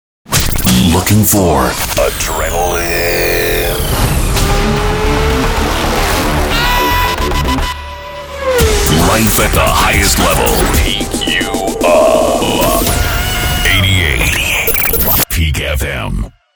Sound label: PEAK FM Jingle